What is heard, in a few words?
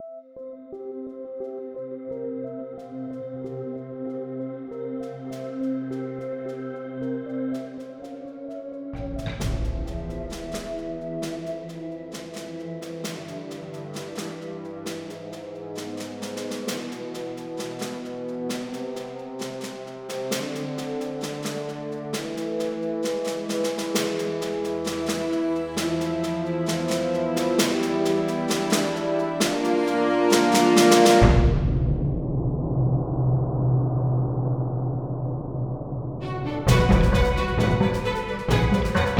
Bonus